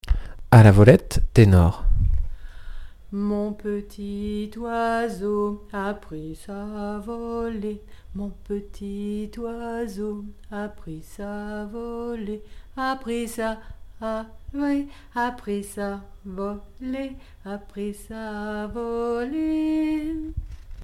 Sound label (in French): A la volette tenor